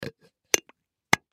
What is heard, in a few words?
Lid Close Glass Candle Holder; Lids; Foley